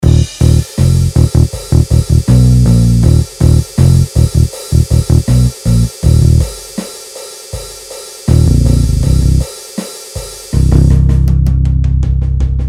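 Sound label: Drum rhythm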